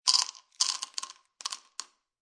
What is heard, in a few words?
Pills Drop Into Bottle Many Small, Foley, Pills Dropping Into Plastic Pill Bottle, Multiple Small Pills